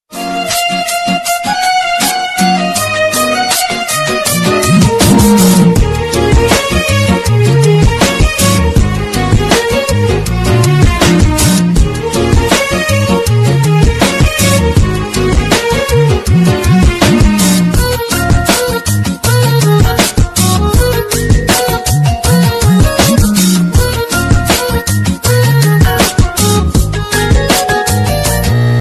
love bgm Ringtone tamil

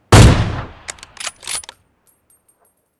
AWM SOUND; pubg; Pubg message tone notification tone

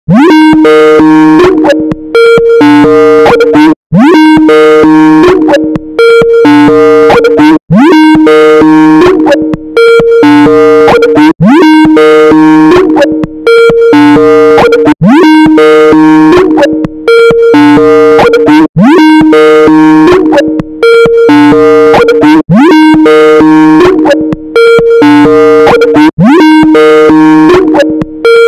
Ringtone bass boosted; Skype

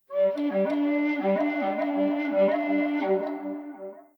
Mando Note

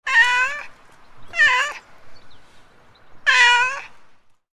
Street cat meowing cat noises

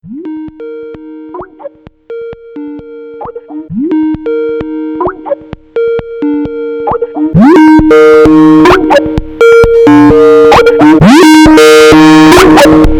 Skype ring tone EARRAPE VERSION

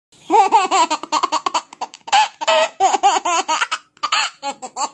cute baby laughing compilation

